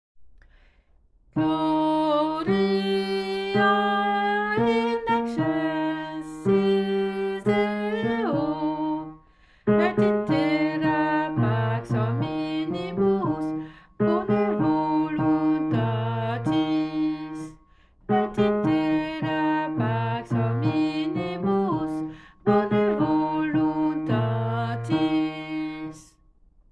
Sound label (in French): Gloria basse